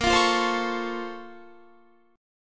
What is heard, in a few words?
Bb Minor Major 9th, Guitar; BbmM9 strummed